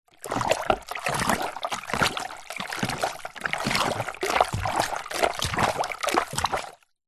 foley liquid plastic item movements in plastic container of water